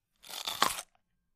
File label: Bite Carrot Juicy; punches kicks hits bones